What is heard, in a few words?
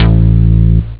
Mellow Bass Guitare; jazz jackrabbit 1; M8